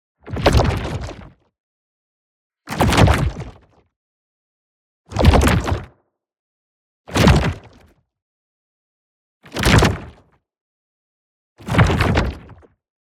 WHOOSH MEDIUM Short Rocky Moves; boom library; Matter

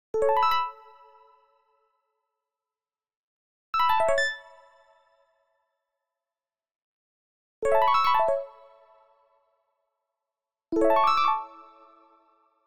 Arcade Jingle Positive

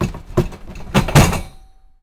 Metal Cart Rattle Hit; Foley - Rattles

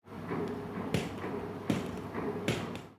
Training Fail Ambi; Pitch Black - A Detective's Demise

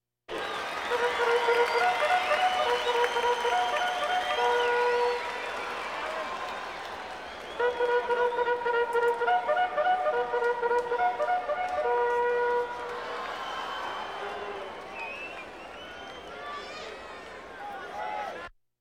Crowds Race cars Baseball

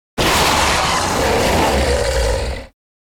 Monster Beast Wounded - Growl; Hollywood Edge; sound designer's toolkit v2

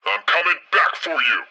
pre reload; Blind Drive

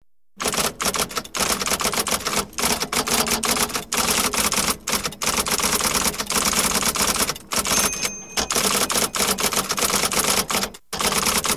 Audience Applause

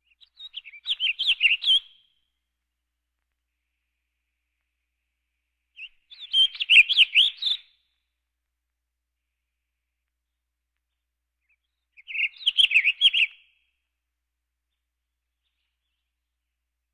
Birds

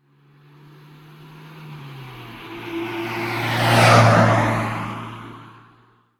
car; Blind Drive

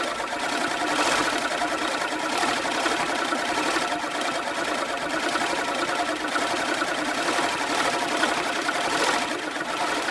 Lawnmower Manual Medium; Home & Office; 1001 Sound Effects

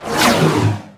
Pickup Scifi Energy; SFX Kit

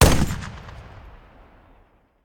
Machine Gun; Sound Ideas; Guns

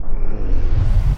Punch Left Speed Up; Thug; Pitch Black - A Detective's Demise

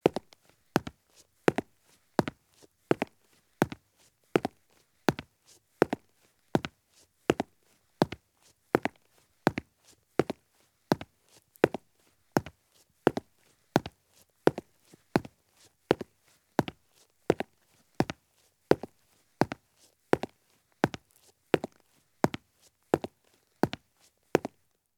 Police Boots Walk at Fast Pace on Concrete; Sound Ideas; Footstep Sound Effects

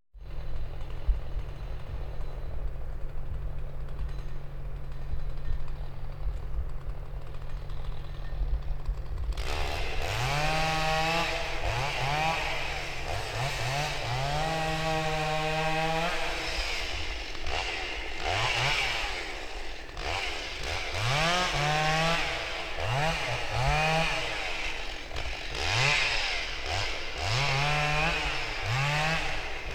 Chain Saw Far Off In The Woods; KMP Film Video Sound Effects

